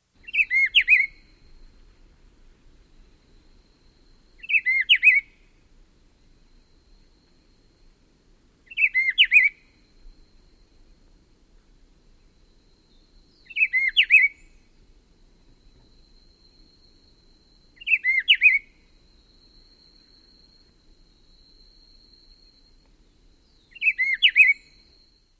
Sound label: Rufous-Browed Peppershrike, S. America